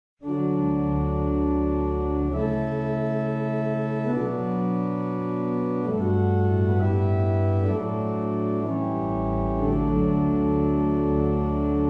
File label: Carlisle, barrel organs; Meldreth